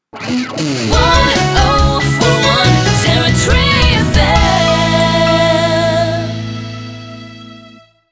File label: Jingle Sting 140bpm; Territory FM